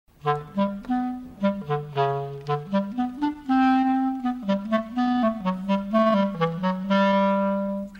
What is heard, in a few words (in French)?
clarinette chat